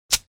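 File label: only air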